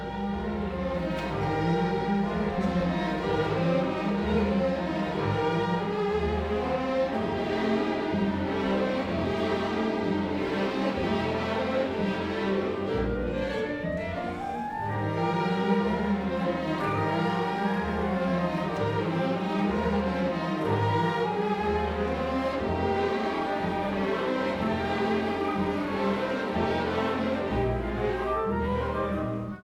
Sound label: End Music